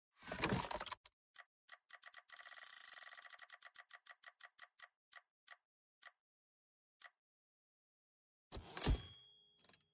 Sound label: case open